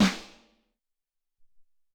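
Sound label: Clap Snare, Alesis SR-18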